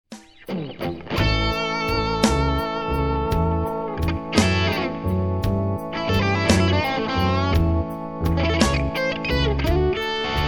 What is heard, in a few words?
tonal center blues, guitar